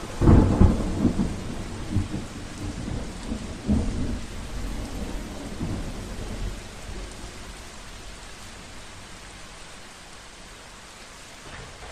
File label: Heavy Rain Sounds